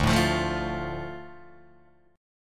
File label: D Minor D Guitar DADGAD